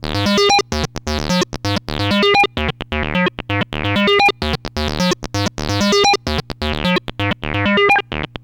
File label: SYNTH SEQ; AMG; Global Trance Mission VOL-2